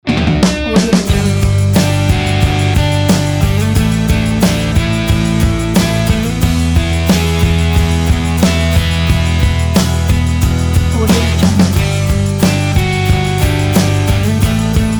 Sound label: brit style intro opener; pablikmm